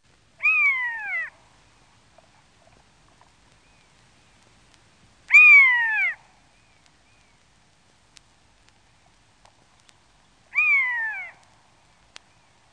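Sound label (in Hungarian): Pusztai ölyv; Madarak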